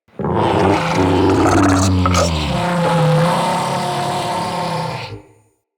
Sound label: Death, Barks, Infested; Enemies; Ancient Shell